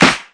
snare

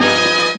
stabs hits

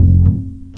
Upright Bass Notes

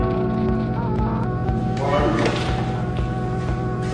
122 odd melodic wavering voxy loop; VOCAL OR VOXY LOOPS

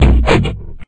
chunky bunch guitar

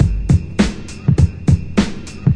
101 reverb hip hopin, drum loops and breaks; 101 to 110 bpm

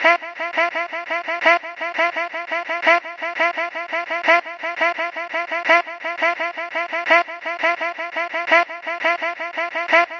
170 chopped vocal rhythm; VOCAL OR VOXY LOOPS

